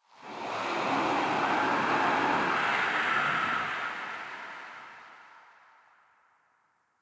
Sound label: Wind Gust Toxic; Ambience; Corrupted Ode